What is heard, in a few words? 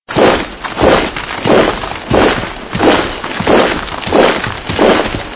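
march steps on snow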